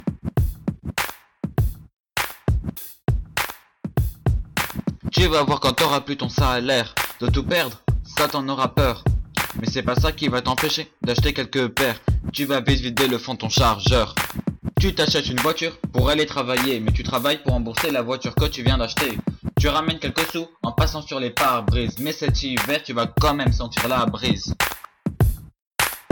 RAP Musique

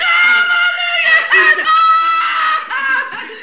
cri souffrance

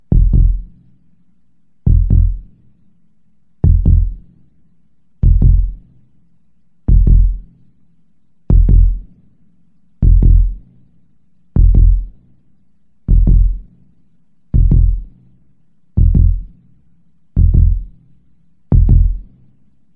battements coeur sons graves

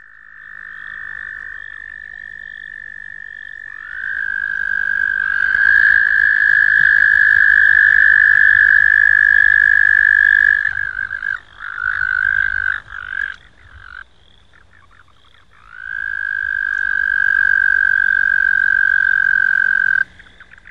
grenouille

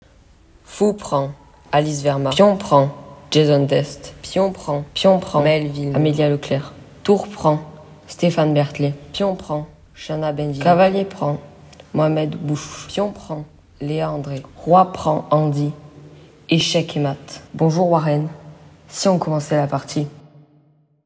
générique de fin

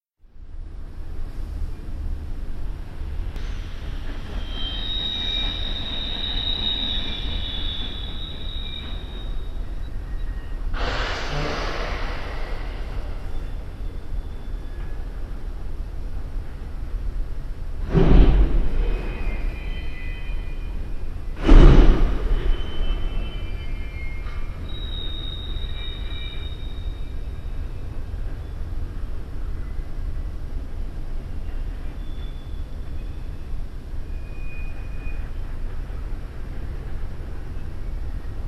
train qui manoeuvre

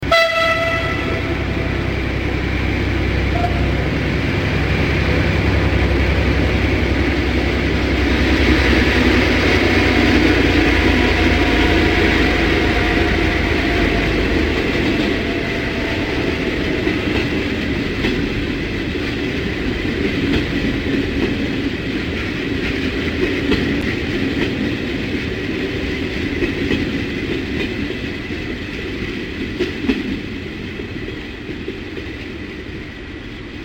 train arrivant en gare et ralentit

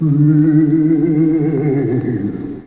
fantômes

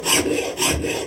scie

